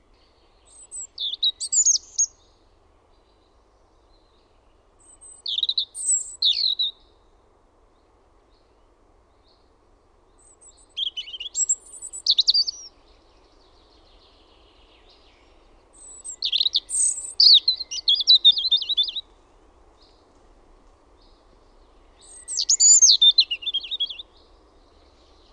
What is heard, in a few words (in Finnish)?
PUNARINTA, Linnut; OMAT AANITYKSET